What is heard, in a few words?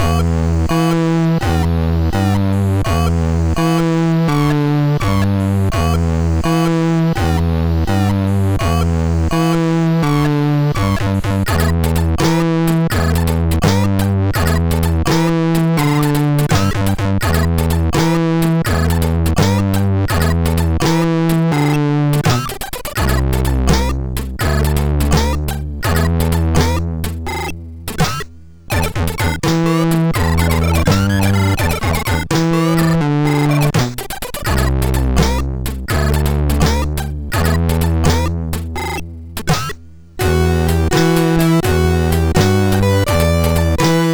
MOS6581; MOS6581R3